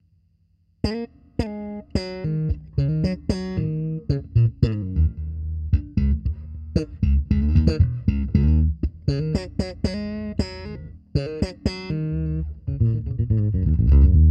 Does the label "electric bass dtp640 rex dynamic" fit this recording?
Yes